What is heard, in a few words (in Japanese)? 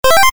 決定 レトロ; モノラル